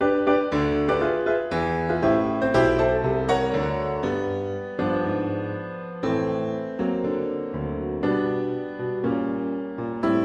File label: Audition Piano Only